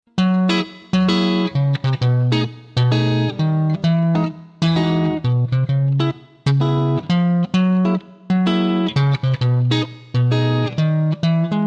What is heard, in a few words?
easy jazz